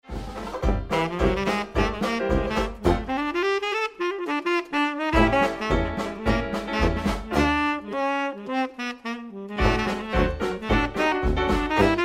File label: sax